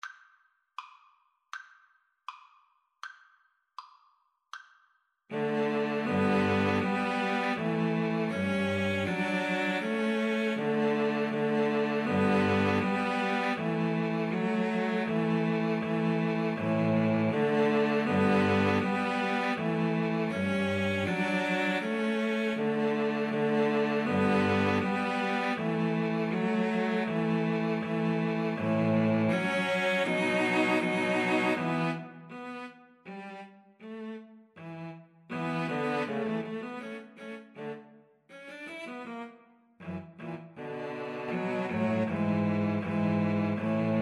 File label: Bach Gavotte Cello Suite 6, String trio